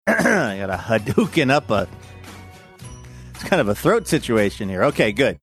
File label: clearing throat saying i gotta hadouken up